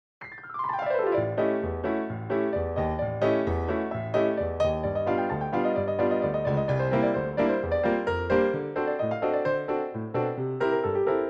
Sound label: instrumental